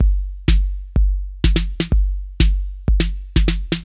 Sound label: drum body stripped 125, Classic Chicago House Drum Loops